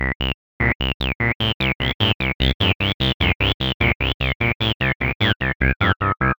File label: KEY, 150BPM